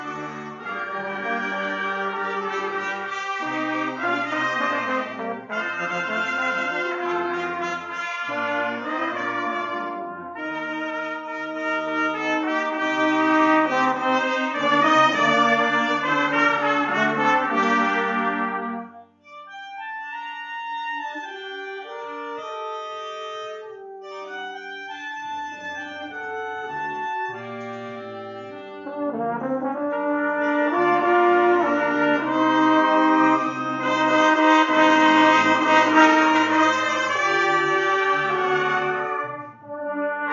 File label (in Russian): Духовой оркестр; Молодёжное общение г.Давлеканово